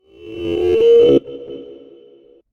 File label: Wine Rise